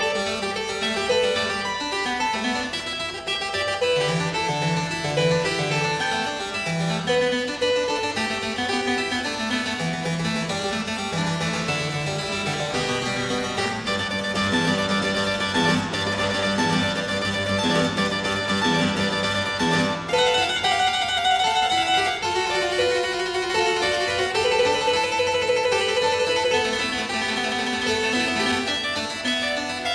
Cembalo Sonatas Sonata No 2 Allegro; Harrison; 6 Cembalo Sonatas

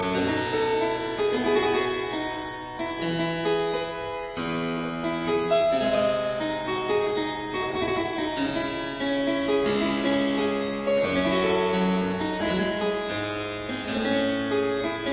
Keyboard Suite in D minor, Allemanda; Froberger; Arthur Haas

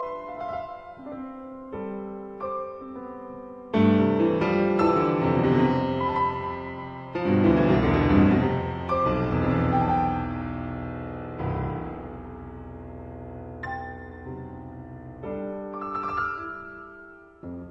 Fugue in C major; 6 Preludes and Fugues; Fugue No 1

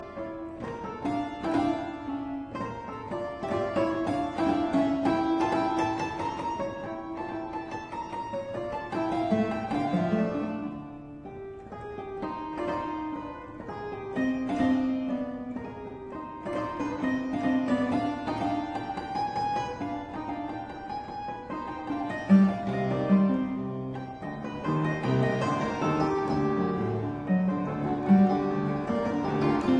Keyboard Sonata in E minor, Allegro; Silva

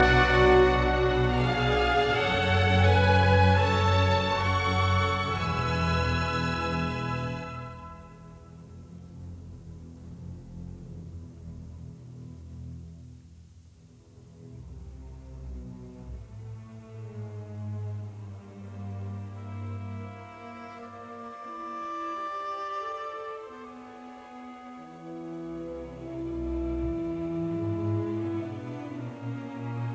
Symphony in D minor, Adagio Langsam feierlich; Symphony No 9; WAB 109; original 1894 version ed A Orel

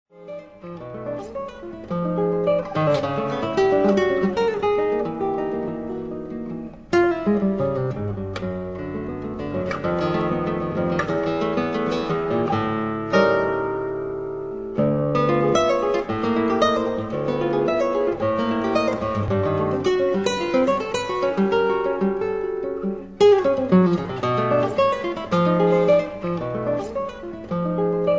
Studies for guitar Estudo; No. 9

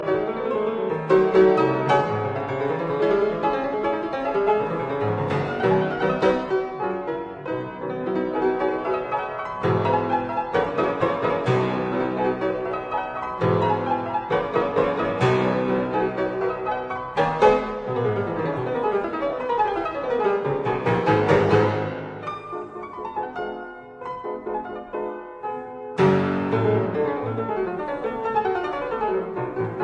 Piano Sonata No 1 in C major Allegro; Op 24

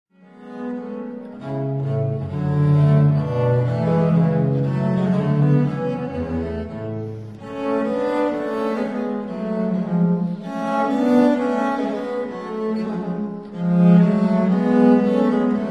concert for 2 instruments in G major, Air

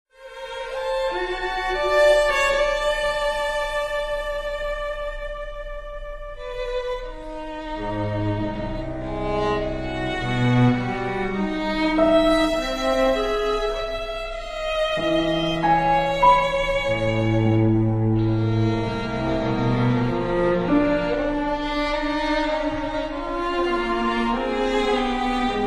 Trio for flute, cello & piano; Luening

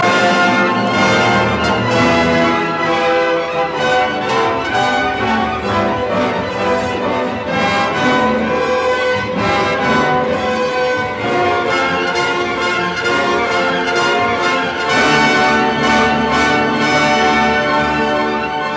Symphony in D minor, Finale Allegro; Symphony No 3; WAB version ed T Raettig

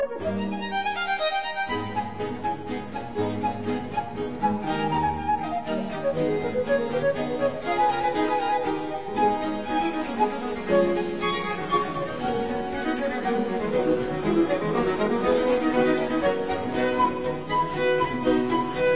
Quartet Allegro; Quatrieme livre de 6 quatuors; No 4 in C major; TWV 43C1

